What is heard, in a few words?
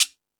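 Jungle Percussion, Drum Machines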